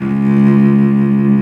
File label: CELLO C#2, SOLO STRINGS; Greytsounds VOL-1